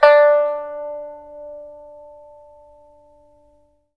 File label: PIPA D5; Spectrasonics; Heart of Asia